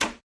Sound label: Breaker Lever; Lethal Company